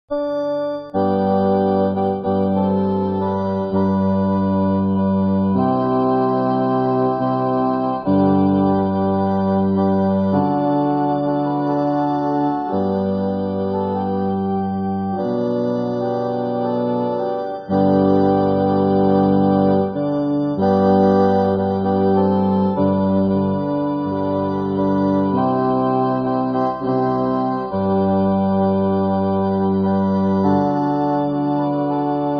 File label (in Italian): solo musica